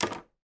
toy tiara plastic drop on table; sound effects one

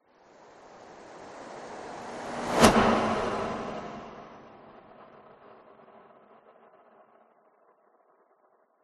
whoosh; orlando lanzini

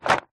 leisure dominos wooden in card box single shake

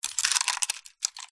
keys on chain movement

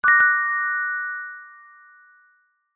fantasy magic chime ping wand fairy godmother

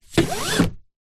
car seatbelt pull stop; transport

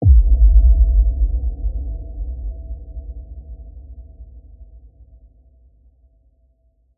Impact; PMSFX

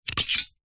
stapler pocket sized open loader; office

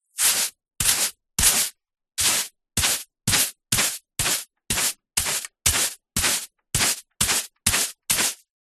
broom brush hard bristled sweep concrete ground; zapsplat

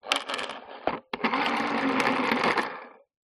household telephone old rotary dial close up

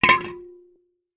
food drink can beer full down; zapsplat

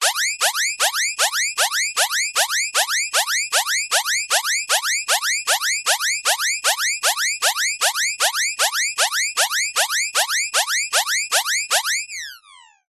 science fiction alarm siren emergency harsh loud

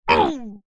human male fight vocalisation grunt; zapsplat

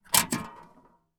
industrial tools hand saw hang on hook; sound effects one

